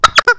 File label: Rubber Chicken